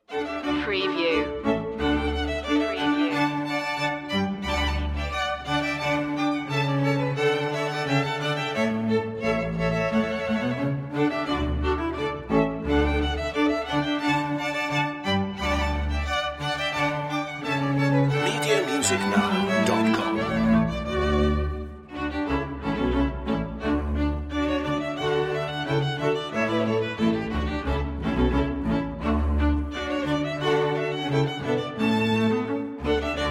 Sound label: fanfares suite rondeau in d major string quintet; mouret; fanfares suite 1 mov 1 rondeau in d major